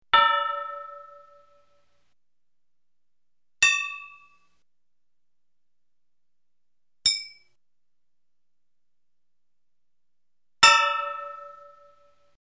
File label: Hammer Metal Anvil Hits X4